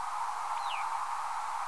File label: Possible Bicknell s Thrush; ETNA NY